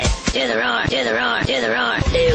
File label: Roar; Shrek; Do The Roar